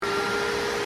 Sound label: sorta loud pc